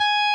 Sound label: solo